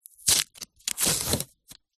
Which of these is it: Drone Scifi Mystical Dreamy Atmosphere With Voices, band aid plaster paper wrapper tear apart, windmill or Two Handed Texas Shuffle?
band aid plaster paper wrapper tear apart